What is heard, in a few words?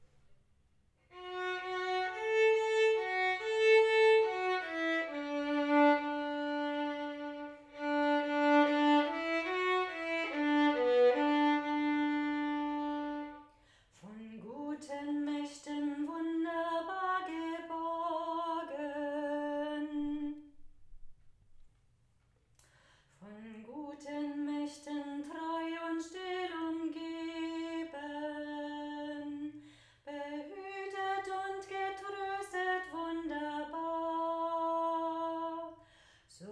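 violin solo singing; trauerfeiern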